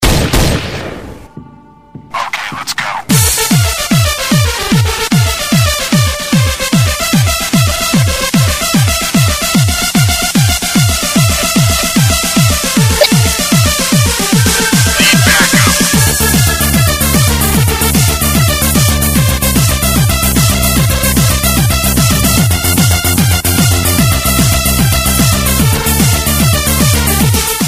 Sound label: techno remix; counter strike